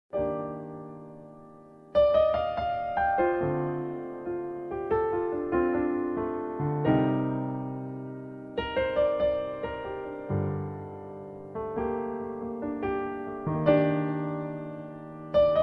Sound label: Evening Waves (Improvisation)